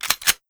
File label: Slide Pull, weapons, 1911; svencoop; 1911meu